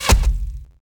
arrow wood

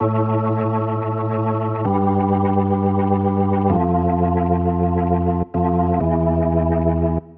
Synt; Evolution 081

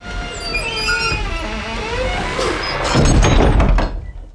door close; DAoC; Toa

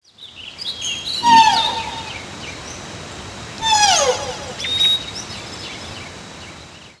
deer; Dorling Kindersley My First Incredible Amazing Dictionary

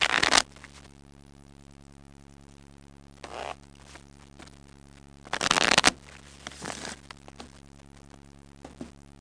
cards; parks

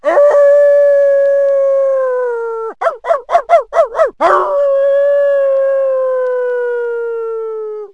dog howl

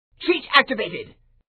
sound cheat; operation graduates

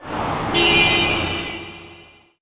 drive; BESTSHOT; noisybit

